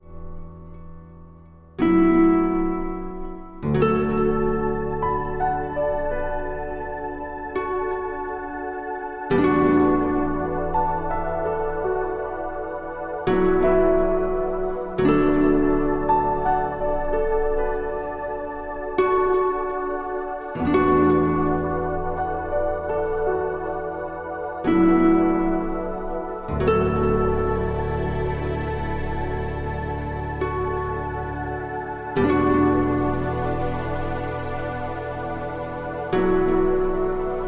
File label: Relaxing Guitar